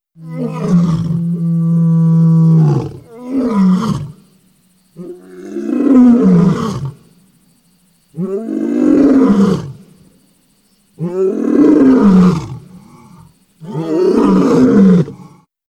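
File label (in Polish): lew, głos lwa; trzylatki; karty aktywnosci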